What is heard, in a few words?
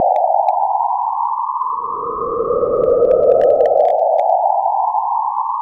overloading